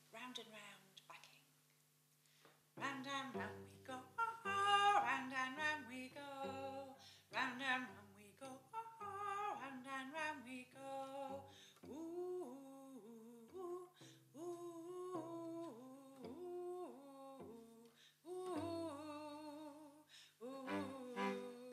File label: Vocal Backing